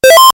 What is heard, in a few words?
se click, 8bit